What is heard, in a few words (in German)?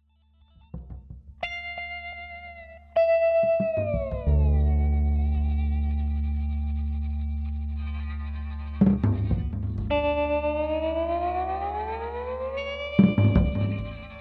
Atmosphaerisch Pulp Groove fluffy voll